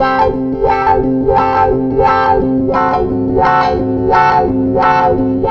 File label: WAH, wah wah gtr; USB Soundscan vol.31; Miro s Grooves; AKAI